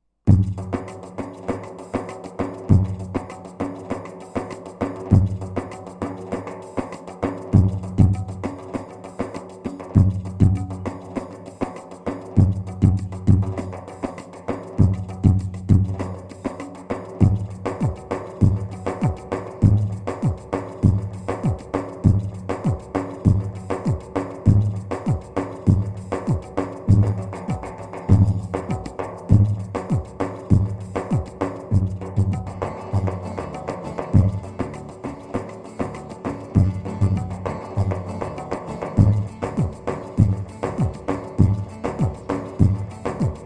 pa 500 tr turku

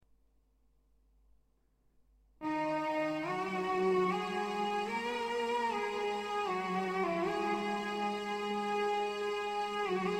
yayli keman kisa; pa 500 turkiye 5 8 ritim

